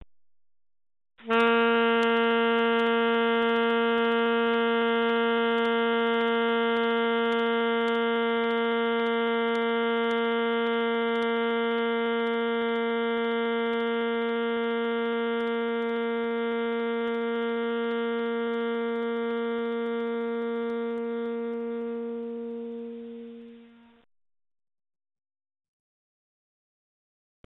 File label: long tone, sax